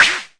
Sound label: mbrute sn, Arturia MiniBrute